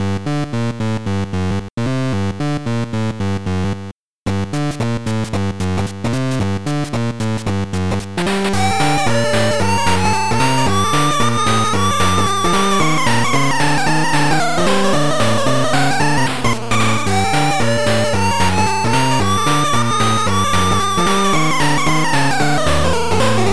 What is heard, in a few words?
8bit